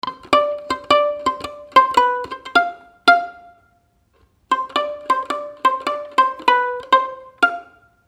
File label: violin pizz.; Una canción para jugar en ronda, pasando en el acento de la canción un sombrero.